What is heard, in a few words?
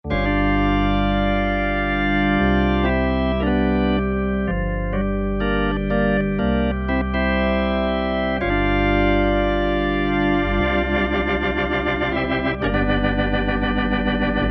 Tone Whl Organ, Kurzweil K2000; KEYBOARD; Kurzweil K2000 Contemporary ROM; Contemporary ROM Programs and Demos diskette